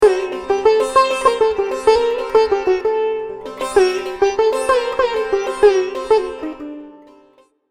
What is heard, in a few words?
Sitar Example